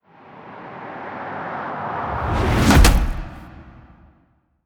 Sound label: Intro Whoosh Land; Tenno; Warframe; Solaris United; Cinematics